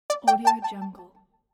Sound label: cute tone